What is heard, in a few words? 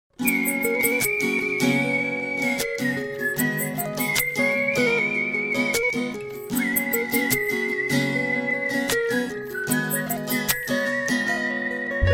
superbbb music, hip hop ringtone